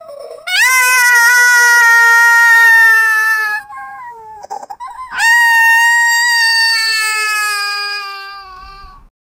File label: monkey crying